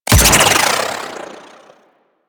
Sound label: Projectile Launch; Redline; Warframe; Runner